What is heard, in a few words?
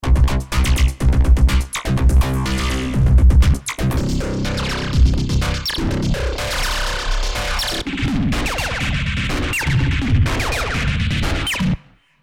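Metal Tek Phrs (Beats), By combining COSM’s Side Band Filter and Amp Simulator of MFX, a metallic resonance is created.; V-Synth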